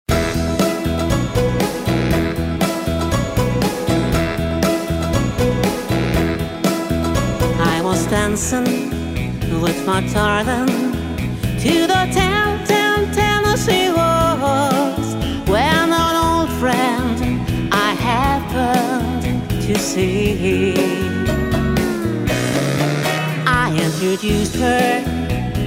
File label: country parlor